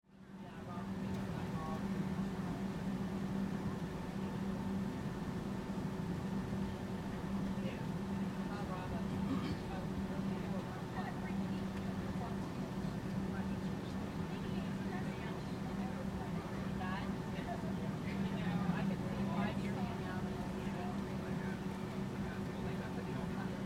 Airplane Take Off, Transportation, Aircraft, Airplane, Take, Off, Interior, Perspective, Commercial, Airliner